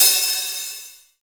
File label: huge open hat one shot e key